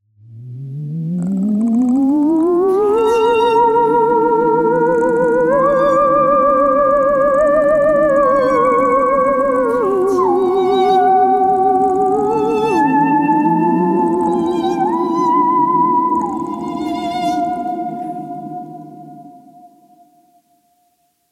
Spooky Halloween thérémine scie musicale

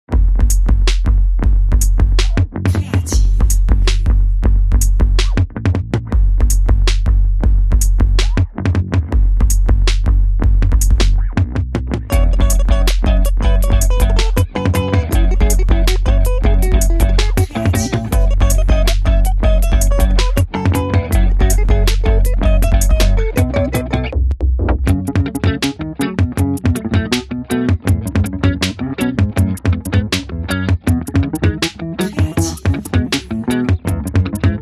High Pulse Dream electronic

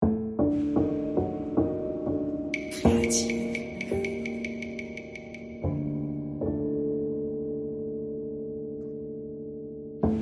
piano préparé

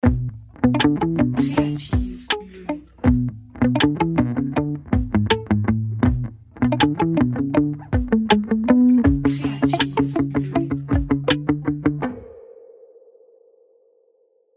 funky

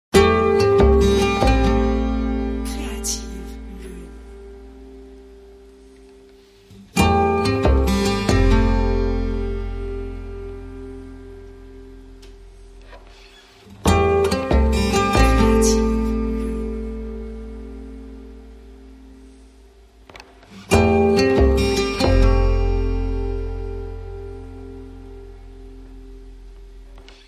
electro pop

